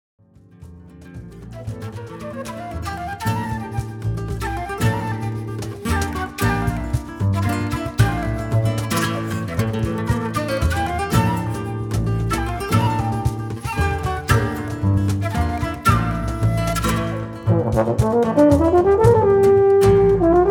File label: BULERIA